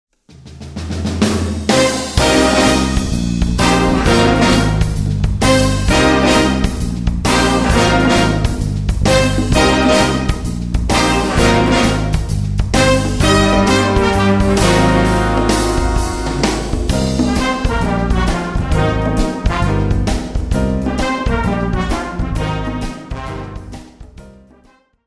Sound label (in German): Bigband